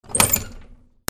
GARAGE

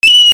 ALARMS

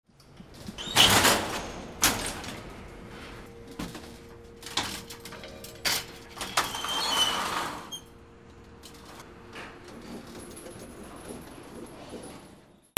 INDUSTRY

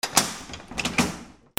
DOORS

